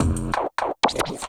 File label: SFX, 180 BPM, Drum & Bass; Ueberschall; Drum & Bass Flash Trackz